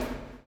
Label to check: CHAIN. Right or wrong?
Right